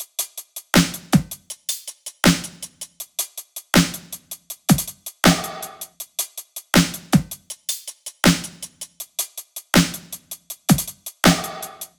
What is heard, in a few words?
top loop, Drums, Loops, Top Loops, Pop; vine 80; Slate; SD PASADENA Pop Sample Pack